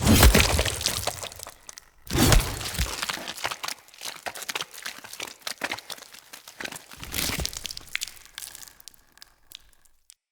Attack Tree; Cinematics; Bromius; Bromius Quest Sap Drink Memory

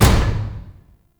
service door close; Ambience; Gameplay; Con Junction; Warframe; Entrati

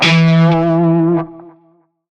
Shawzin Flourish Oct Two E; Music; Warframe; Narmer Shawzin

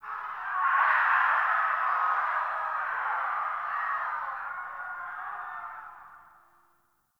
Distant Ambient Battle Cries; Warframe; Tau

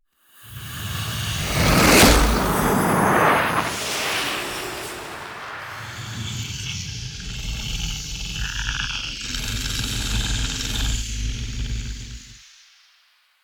SFX Queen Pull; Warframe; The War Within; Queens Lair; Cinematics

